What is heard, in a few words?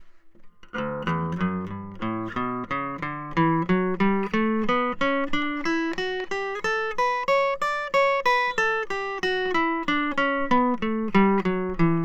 Guitar acoustic PREAMP